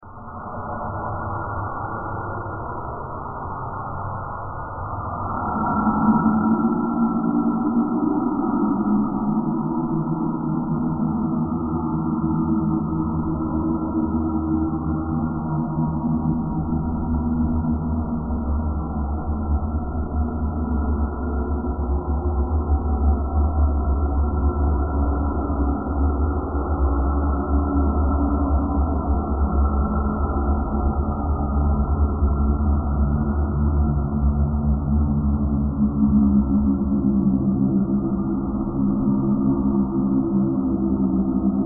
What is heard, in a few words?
demonic ambience